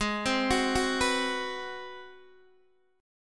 Ab 7th Sharp 9th Guitar DADGAD, chord

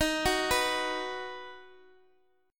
Eb Minor Sharp 5th, Guitar, Open G

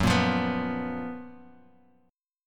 Gb Major 11th

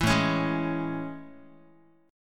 A Major 7th A, Guitar; AM7; strummed; 7,5,4,6,x,x

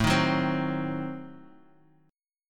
A Diminished 7th, Guitar Open D; chord

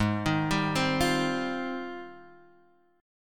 Gsharp Major 13th, G#M13 chord; arpeggiated; 4,6,5,5,6,x; Standard

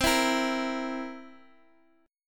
Bb Suspended 2nd Flat 5th

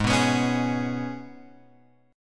G sharp Minor Double Flat 5th G sharp; {4 2 X 4 2 4} strummed

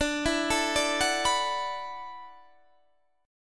E 7th Suspended 2nd Suspended 4th, D

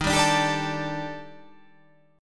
D sharp Major 7th Flat 5th, down, chord

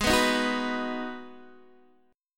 G sharp Minor 7th G sharp, Guitar Open D; x,11,9,9,9,9

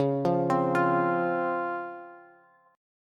Db Major 7th Db down Bass; arpeggiated; JGuitar

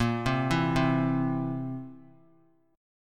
Bb Suspended 2nd Flat 5th Bb down; arpeggiated

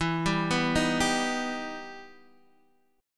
E Minor Major 7th E down Guitar Open D

